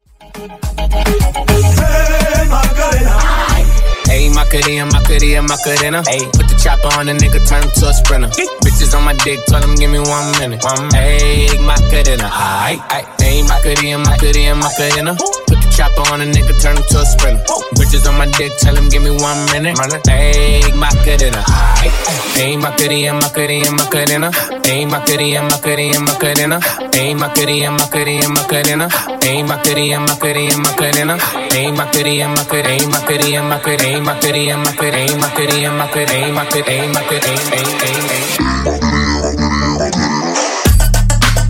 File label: Remix Segue Dirty Segue 105Bpm